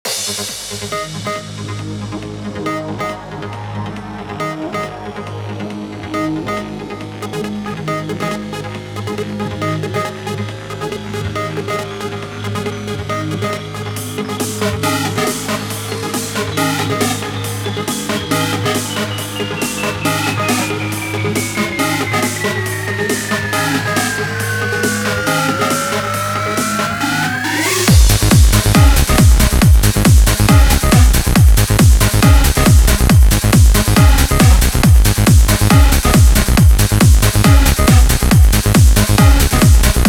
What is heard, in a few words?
138BPM Complete Mix, G#minor; Vengeance Samples; Manhattan Kit